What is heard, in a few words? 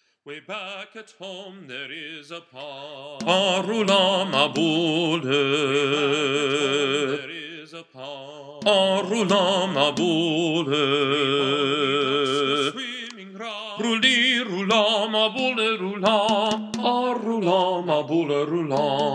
Tenor